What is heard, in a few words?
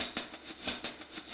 Cymbol Particle; Particles